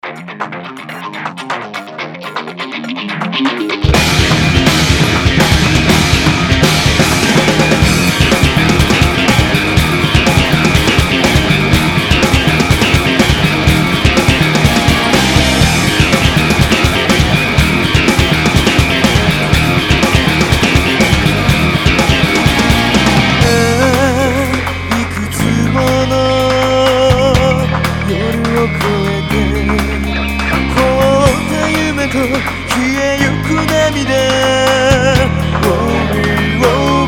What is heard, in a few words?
ringtone; claymore op